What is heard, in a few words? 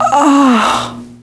DYING